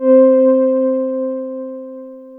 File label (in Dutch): Zachte Stemmen, FM; Polyend